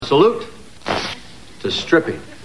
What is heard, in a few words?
stripping